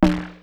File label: GROWL; Masterbits - Total Zone; ADD.PERC.